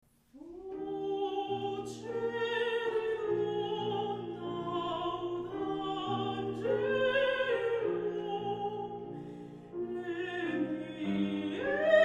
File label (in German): Voce di donna, Mezzosopran; Ponchielli; Meisterkurs